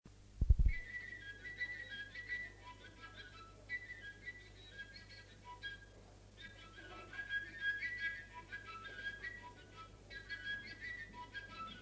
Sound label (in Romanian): Cantec de joc la tilinca; jocuri si cantece batranesti; Vaslui